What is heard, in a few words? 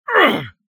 Walking in Sticky Goo